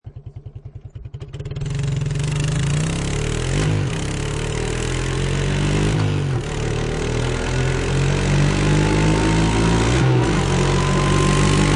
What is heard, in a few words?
Quad Bike On Board Driving; Motorbike, motorcycle type Quadbike. Drive around, onboard perspective